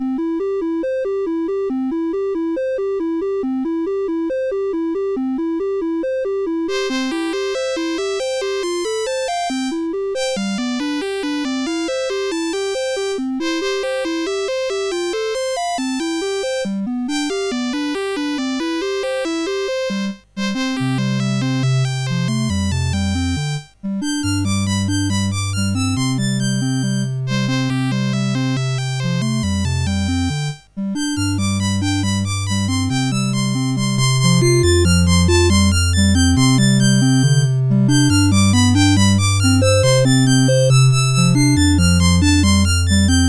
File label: CSG8580; CSG8580R5; Big T Cat